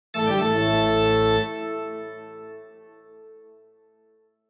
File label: Toccata Text